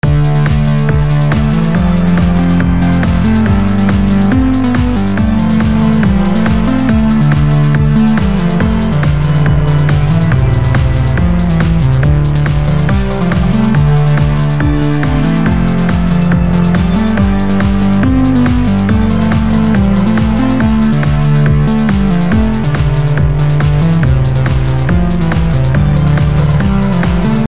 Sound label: edm junk file unmixed; lost